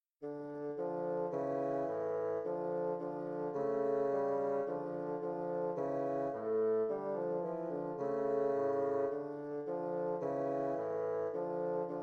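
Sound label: gavotte bassoon duet; witthauer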